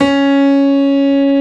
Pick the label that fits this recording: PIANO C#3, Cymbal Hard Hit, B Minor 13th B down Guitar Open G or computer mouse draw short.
PIANO C#3